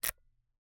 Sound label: Camera, Kinusa K77, 50mm lens, plastic, serrated, lens adjust, quick; Eiravaein Works; Vaeyan IV